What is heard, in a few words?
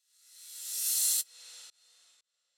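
Reverb Fx, EDM 120 bpm; Future House; Drop; Ableton Projects; Big EDM 120 bpm (Drop) Project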